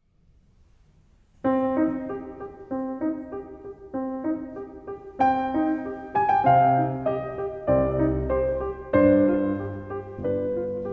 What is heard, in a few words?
Piano Concerto No. 13 in C Major, Adagio; K. 415; Abandoned Adagio